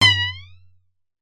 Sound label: comedy spring twang; sound effects one